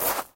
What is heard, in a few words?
rocks step; Original TK Sounds